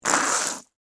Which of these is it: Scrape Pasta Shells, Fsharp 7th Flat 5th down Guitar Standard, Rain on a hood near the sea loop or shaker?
Scrape Pasta Shells